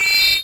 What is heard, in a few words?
MET SW SIN, SWORDSING